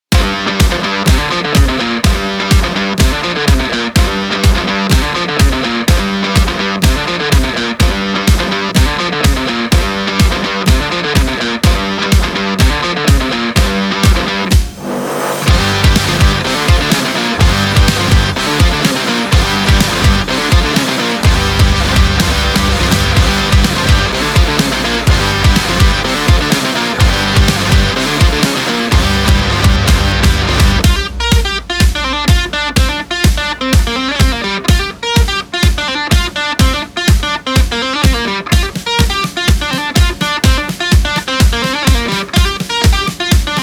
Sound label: Sport Driving and Positive Action Rock